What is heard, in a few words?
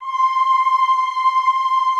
AHH C5, Classical Choir; Best Service; Extended Classical Choir